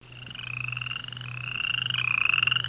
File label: FROGS